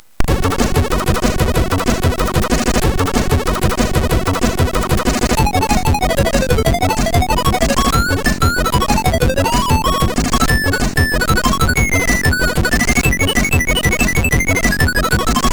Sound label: Bill Bass, MOS6581R4; hvsc